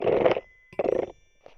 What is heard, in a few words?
contact perc